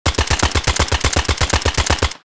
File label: Tactical assault rifle full auto fire